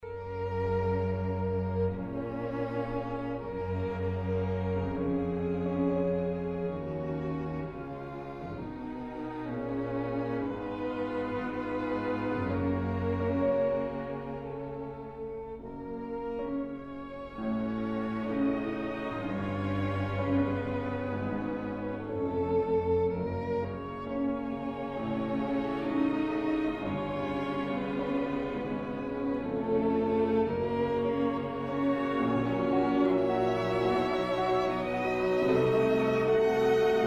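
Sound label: piano quintet; kiel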